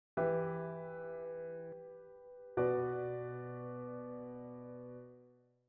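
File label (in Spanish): cadencia plagal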